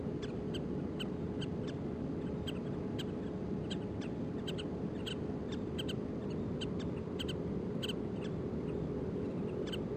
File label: Pratincole flock